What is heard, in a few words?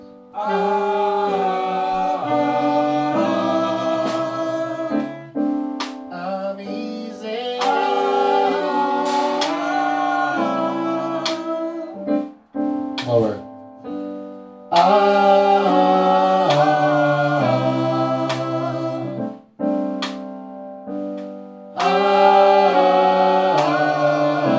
Easy - Harmonies